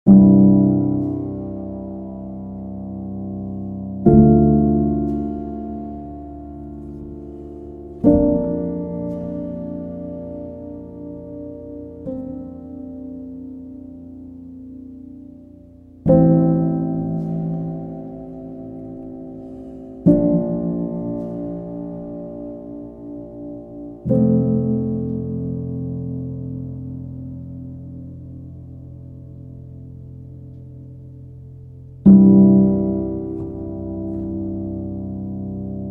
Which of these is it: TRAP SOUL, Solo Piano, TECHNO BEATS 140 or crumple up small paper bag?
Solo Piano